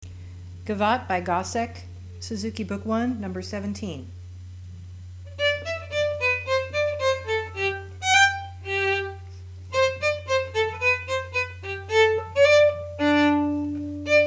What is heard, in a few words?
Gavotte; Gossec